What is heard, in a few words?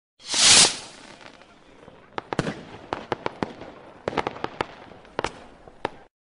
Fireworks Sound; New Year Ringtones